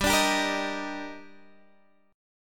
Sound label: D sharp Major 7th